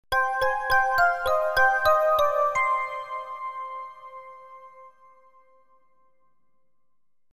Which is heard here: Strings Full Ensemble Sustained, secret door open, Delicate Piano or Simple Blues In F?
secret door open